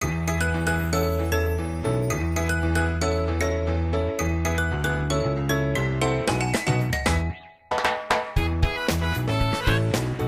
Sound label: login bgm; Bubs Puzzle Blast; Android